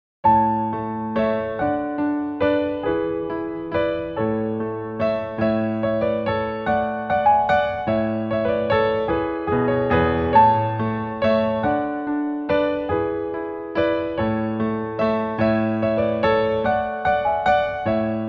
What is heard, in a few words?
Two German Dances in 3 2